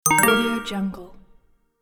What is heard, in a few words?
pick coin